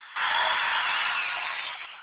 Crowd roar; Audiovox CDM-9900

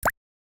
Water Drop Notification; Xiaomi Redmi-Note-12-4G

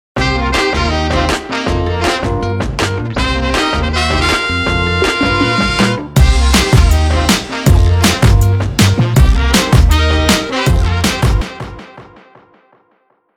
Jet set; Sony Ericsson; M1i Aspen

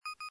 TA connect, phonetones, LG A250 system

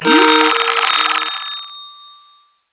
incoming Call, WiFi Phone; Netgear; SPH101

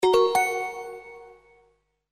reminder; QSystemSounds

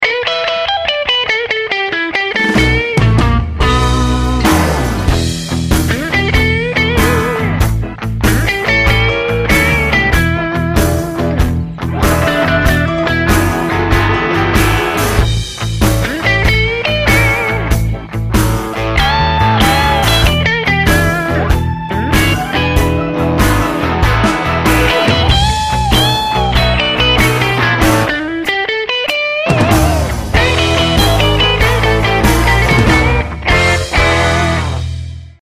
Blues on E; LG JN240 Remarq

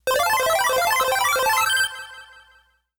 Power Up; Google; Pixel-4; Retro Riffs

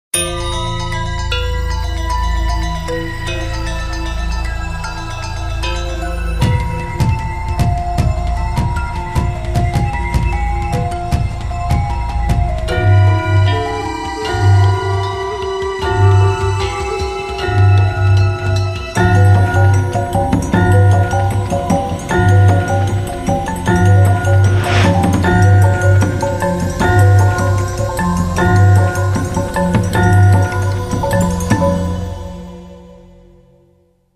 Wind Dance, Nokia Tones

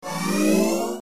sound transf open; Nokia; 6270; Organic Mutation

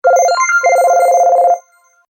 Soft Ring, Ringtones; LG SU900 Prototype

